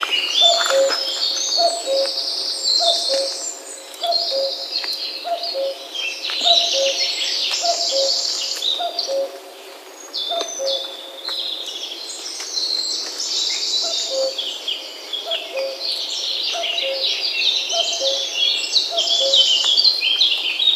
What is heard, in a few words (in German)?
Kuckuck; Ornithologie